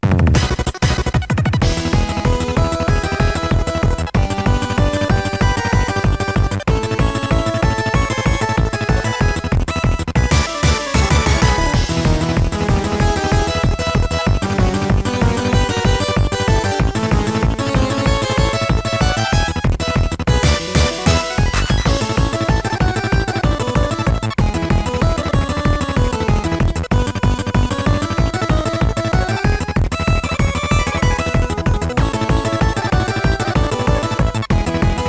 Full Tilt; bomberman ultra live gamerip; Blast!

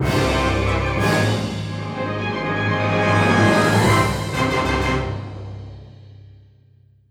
Battle Cleared; Ancient Gardens; Mario + Rabbids Kingdom Battle

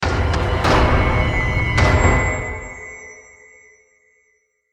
Stinger Action Medium

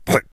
Pig Singing; Angry Birds

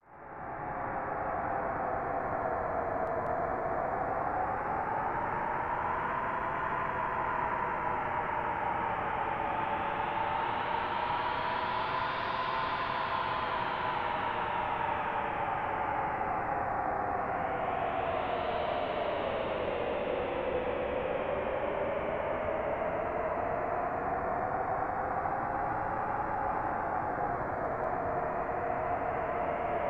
Heavy Wind; Majin Tensei; SNES

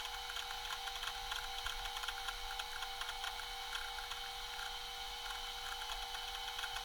Computer

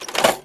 sound Bomb Dispenser

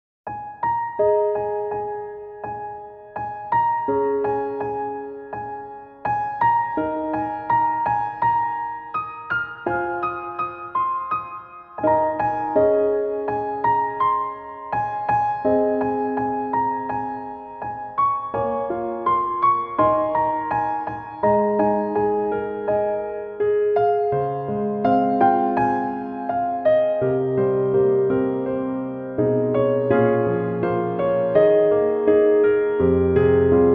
Piano Ver.; Yozakura Quartet Hana no Uta; Tsukiyomi

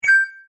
SFX Item; yet another pixel dungeon android

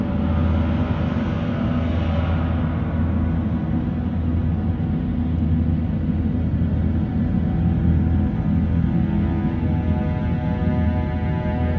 Background Theme Death Star; android game music; angry birds star wars

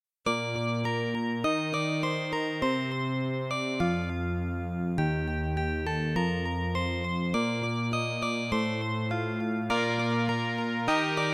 Warning; Algarna; OKOTOWARI

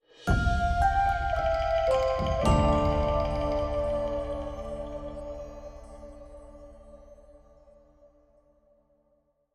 complete Quest Stinger D

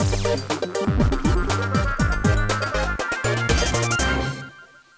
Triumph Sting

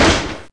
hit wood

